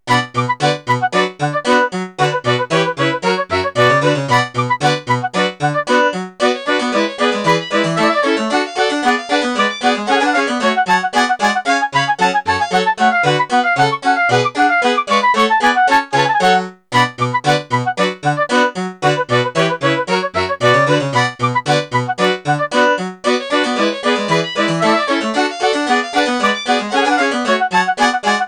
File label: midi